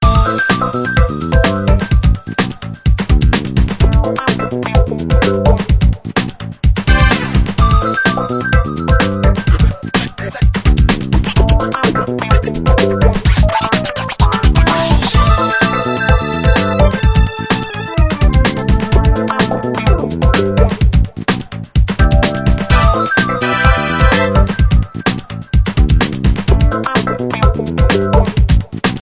raw music tune ringtone

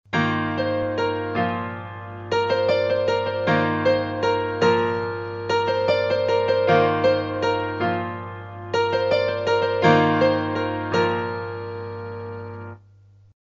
piano ringtone

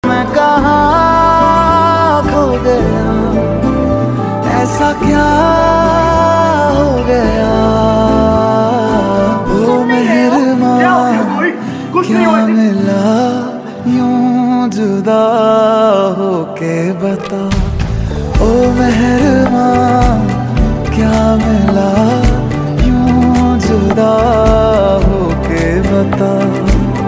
hindi ringtone

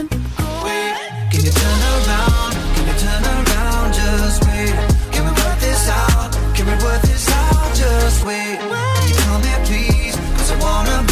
cool ringtone

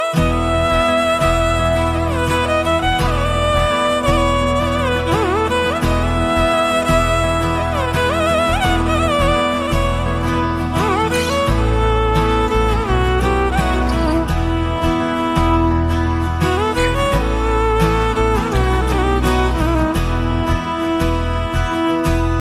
90s guitar ringtone

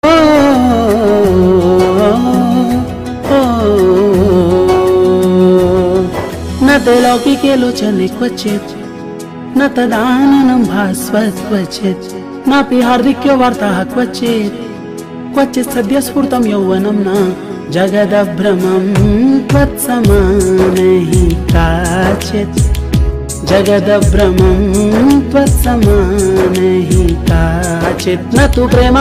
sanskrit ringtone

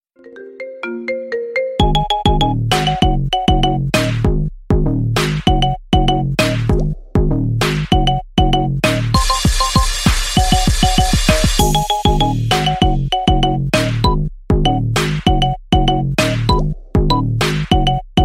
iphone x ringtone; apple

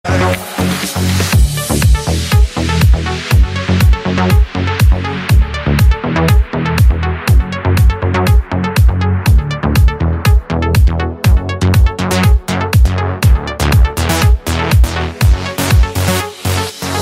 epic ringtone

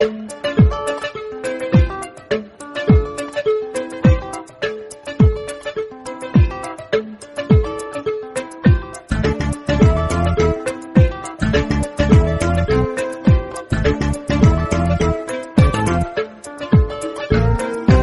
african tune

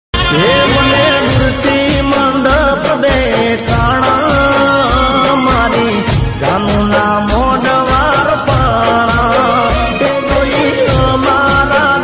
gujarati ringtone

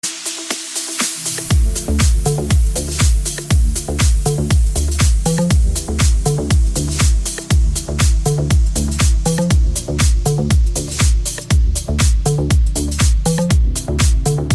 samsung all mobile ringtone

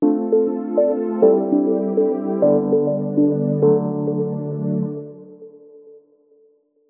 yamdrok ringtone

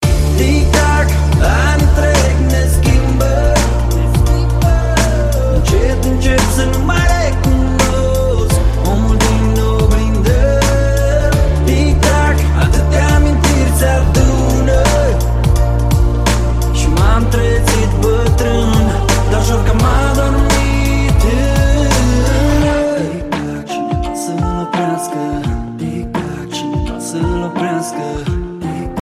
tic tac ringtone

